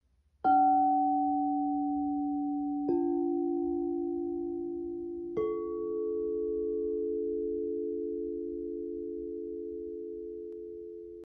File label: Crystal bowl; کاسه کریستالی